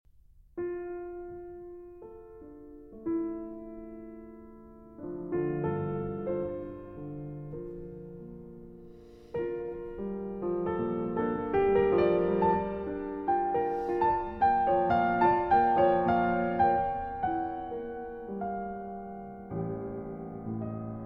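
Preludes, in D Minor, Andante Con Moto, Piano Works; 9 Preludes, Op. 1; Szymanowski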